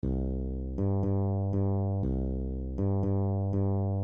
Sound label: Bossa Nova Groove Variations